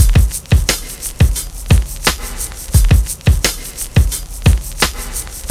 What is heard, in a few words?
And Wah Wah; Zero-G; Beneath The Planet of The Breaks